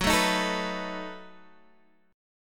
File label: B 7th Gb